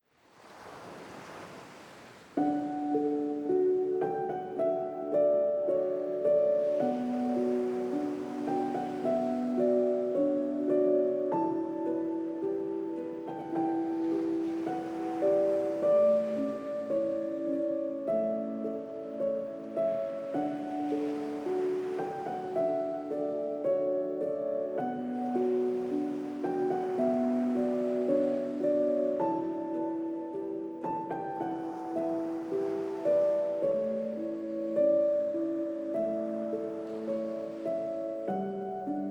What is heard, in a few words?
piano magic waves